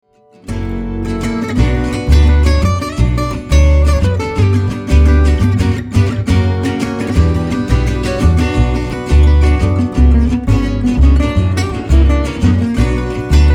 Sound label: pentaphonic